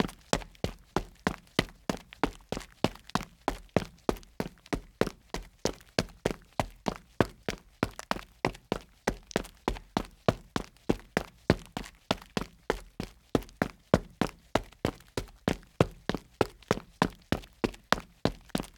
foley footsteps asphalt boot walk fast run jog close; sonniss gdc